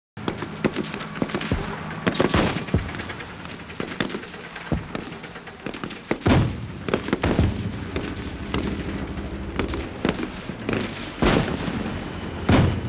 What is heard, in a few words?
battle mix left; ambience